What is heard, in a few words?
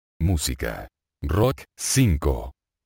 rock